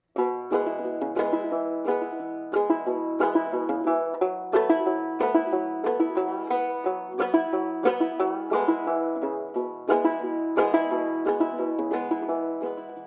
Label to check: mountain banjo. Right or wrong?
Right